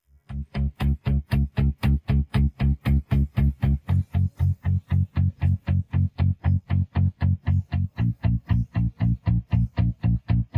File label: bass, a musical work with a sense of live performance